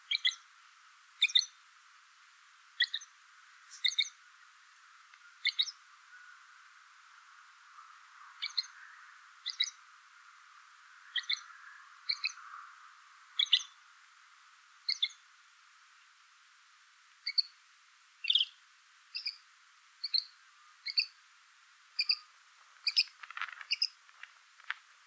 pie grieche grise lanius excubitor

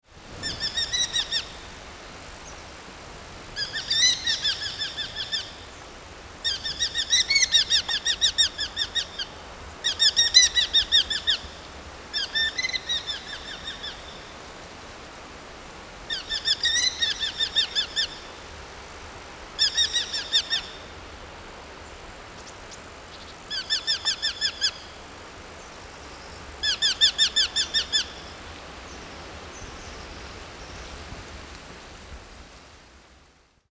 faucon hobereau falco subbuteo

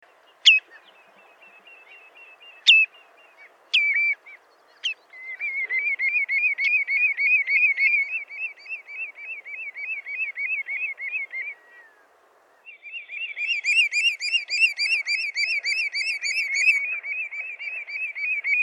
chevalier gambette

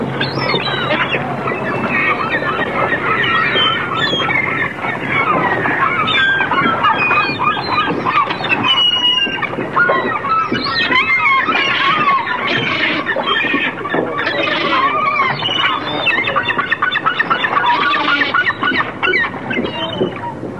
goeland

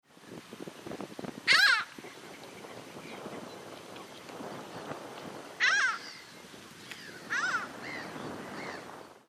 mouette melano

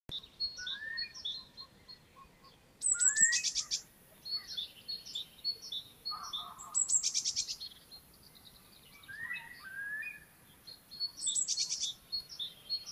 courlis cendre numenius arquata

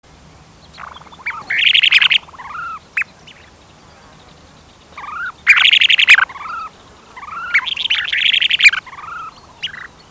gravelot a collier interrompu

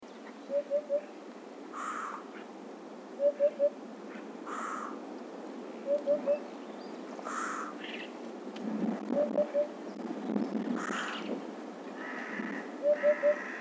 huppe fasciee

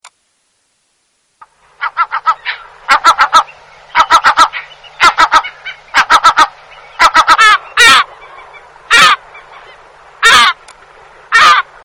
goeland brun

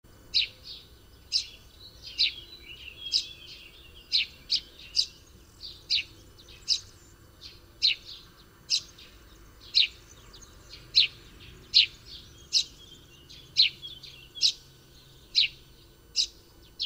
moineau friquet

